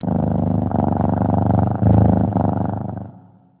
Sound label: Purr; Nokia; 6235i